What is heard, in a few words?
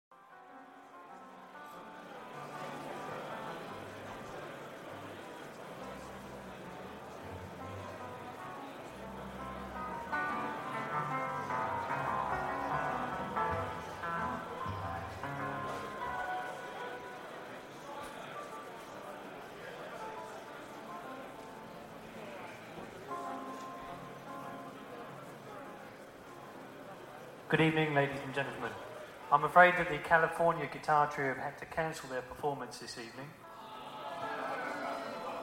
Introductory Soundscape